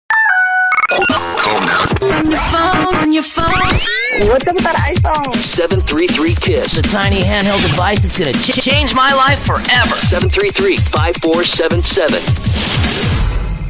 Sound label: cricket wireless ringtone sounder